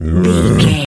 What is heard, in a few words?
gorilla growl deep